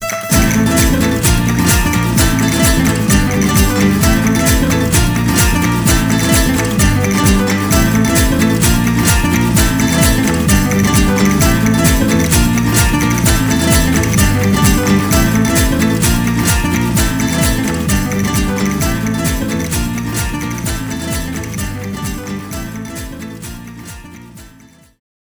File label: Player Down; Don Flamenco